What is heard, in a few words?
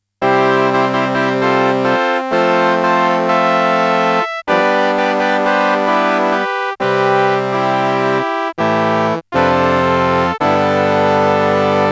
Casio MT 400V Trumpet Horn